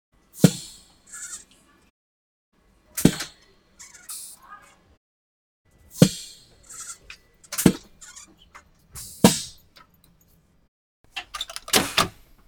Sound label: Door Beetwen Wagons Presurized Open Close Slide Train